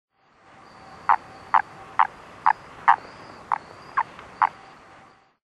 Boophis madagascariensis

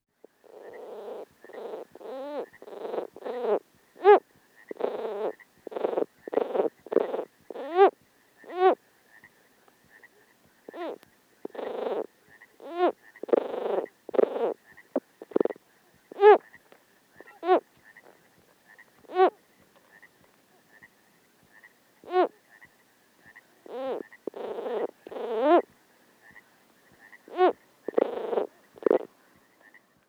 Rana sierrae

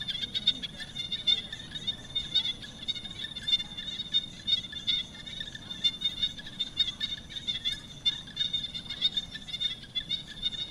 Phrynoglossus martensii

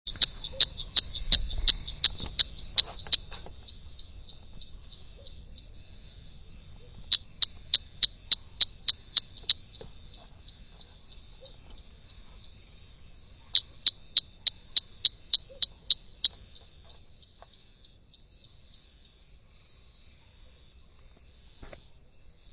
Dendropsophus jimi

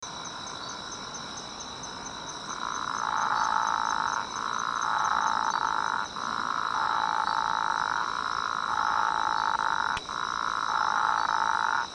Physalaemus atlanticus

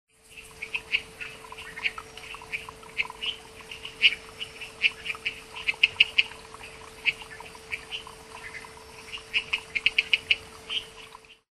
Boophis boehmei

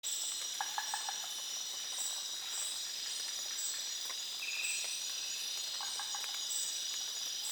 Rhacophorus norhayatii

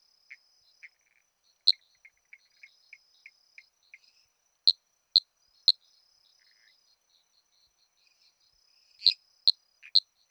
Dendropsophus tritaeniatus

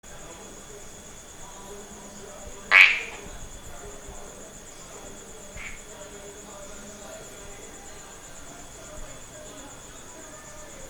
Polypedates leucomystax

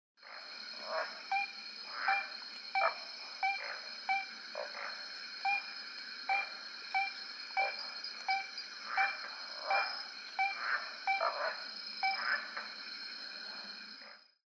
Aplastodiscus leucopygius

